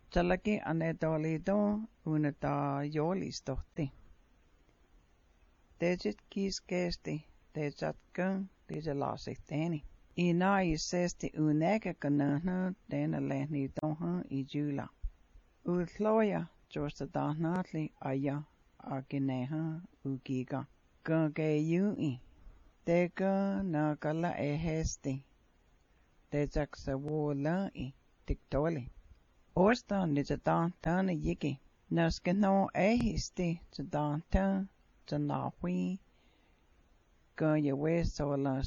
Cherokee Greeting, peace choir; Greeting Spoken in Cherokee Language

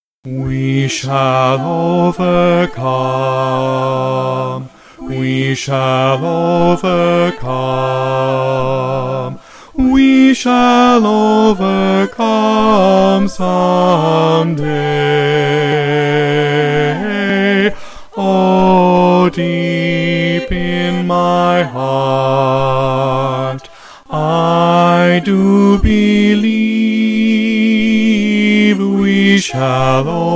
We Shall Overcome bass

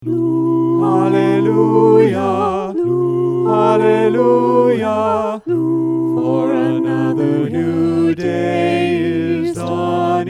tenor low; All (with LOW version Tenor part); 2023 Fall Repertoire

